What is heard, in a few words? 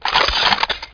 rail charge arm; MOTS